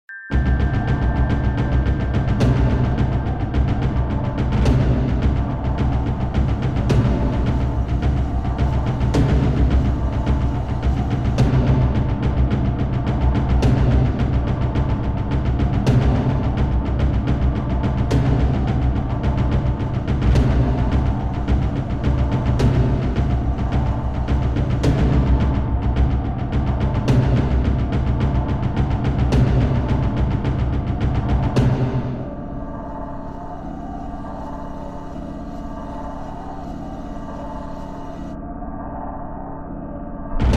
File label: music; svencoop; sence; mistake coop